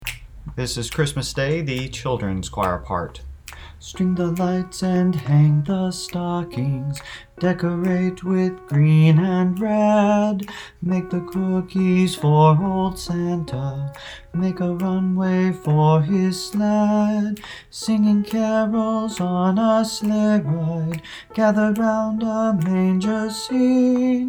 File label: Childrens Choir